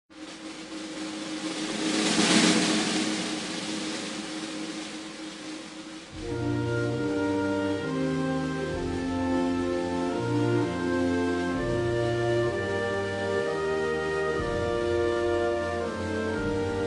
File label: hymne uk